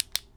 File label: Click Button; bb - Smol Mechanisms